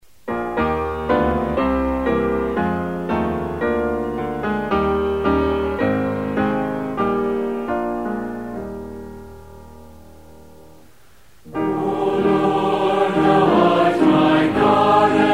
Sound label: hymn, choral accompaniment; bible hymnal